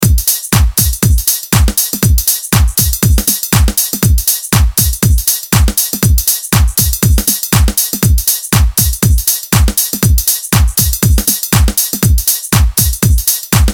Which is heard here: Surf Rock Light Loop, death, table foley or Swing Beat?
Swing Beat